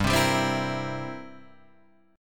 G 6th Suspended 4th Sharp 9th, guitar chord; G6sus4#9 strummed; JGuitar

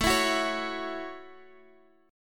A 6th, Guitar, Standard; x,12,14,11,14,x; strummed; capo=9

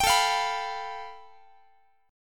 A 7th Suspended 2nd Sharp 5th, Guitar; A7sus2#5 strummed; JGuitar

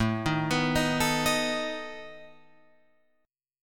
A Suspended 2nd Flat 5th A down Guitar Open F; Asus2b5 arpeggiated

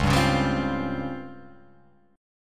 C sharp Major 7th Flat 5th, chord

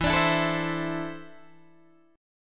E 7th Suspended 4th Sharp 5th, Guitar, DADGAD

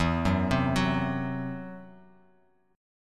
E Suspended 2nd Sharp 5th; Esus2#5 arpeggiated; 12,9,10,11

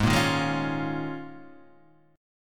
Eb 7th Suspended 2nd Suspended 4th Ab

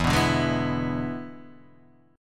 E 7th E down

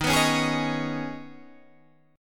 Eb 7th Suspended 4th Sharp 5th; Eb7sus4#5; strummed

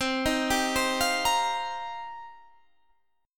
C Minor 11th C Guitar Standard; arpeggiated; JGuitar

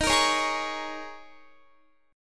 Eb 7th Suspended 4th, Guitar; Eb7sus4; strummed

